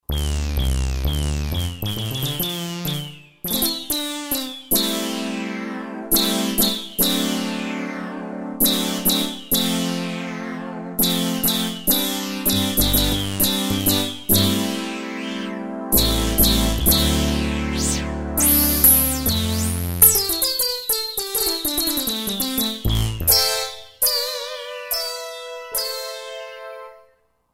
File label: Hybrid Poly Syn, Roland JD-990; Factory Patches